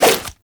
Nunchuck End Whoosh; Weapons; Warframe; Infested

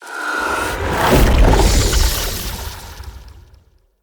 Polymorph Spider Cast; Enemy; Abilities; Characters; Mendicant; Mnd Wazard

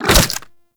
Desert Skate Attack Fire

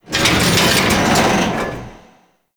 Sea Lab Hanging Chain; Warframe; The New War

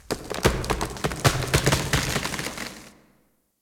Hub Tree Creak; Ambience; Warframe; Tenno Hub

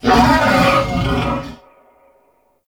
Wolf Death; Animals; Duviri

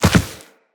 Land Soft Dirt, Footsteps; Characters; Operator

